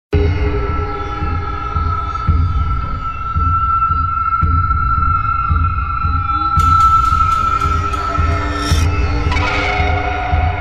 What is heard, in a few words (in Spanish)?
musica de terror